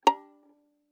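Violin pizzicato fortissimo on A string Bb5 stereo; MIS Pitches - 2014; Strings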